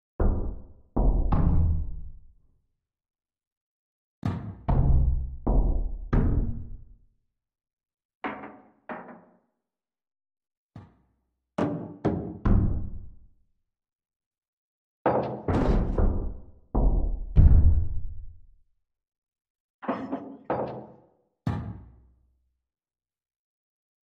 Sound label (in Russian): metall gluhie udari i stuki